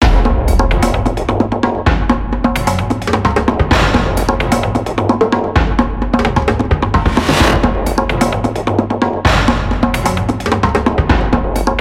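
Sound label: Drum Fight Loop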